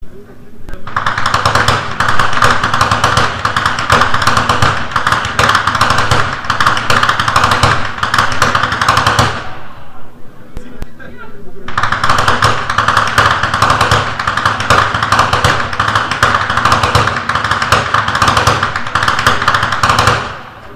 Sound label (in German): schnalzen; sillersdorf; saaldorf